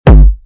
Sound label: step; poppf drumseratops cursed